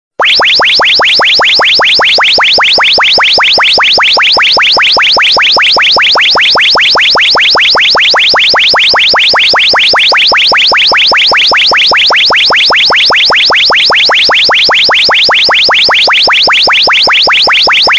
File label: New Loud Alarm Ringtone; ringtones